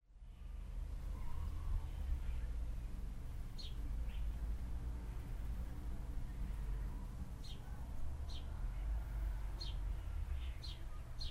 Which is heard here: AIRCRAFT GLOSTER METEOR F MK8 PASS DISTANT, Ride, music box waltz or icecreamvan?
icecreamvan